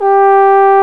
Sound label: BRS TRB, Solo T.Bone; Roland L-CD702